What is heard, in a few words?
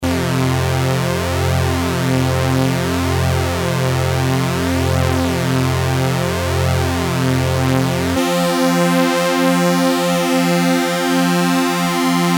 ada flanger